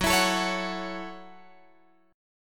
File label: Gb Minor 7th, Guitar Open F; Gbm7; strummed; x,13,16,16,16,13